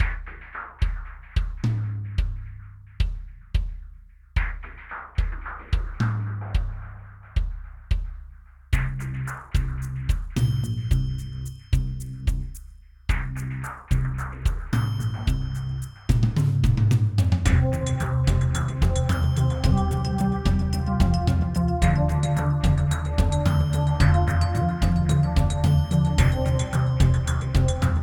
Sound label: Ruins Interior; Frogun